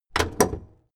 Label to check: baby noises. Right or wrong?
Wrong